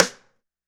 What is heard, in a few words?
DRY RIM, STUDIO DRY SNARE